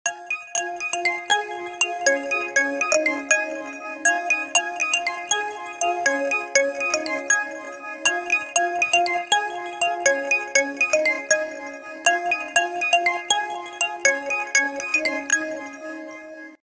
samsung tone

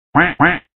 double quack

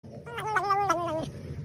tin nhan cute funny sms ringtone

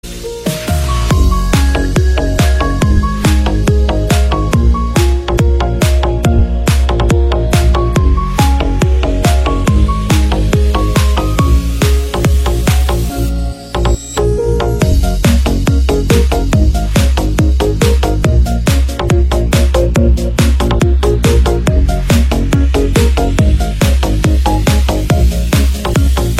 piano

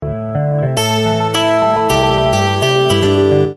sms; tin nhan; honor pad 9

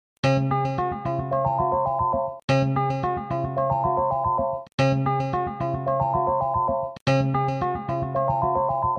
samsung galaxy s23 fe

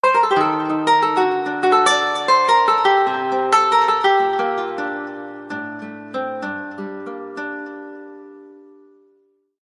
sony flamenco xperia

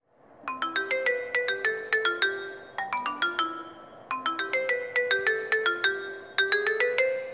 marimba sms

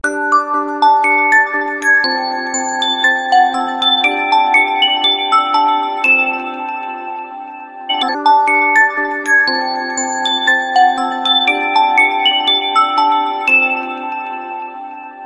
samsung sapphire

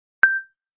nokia beep

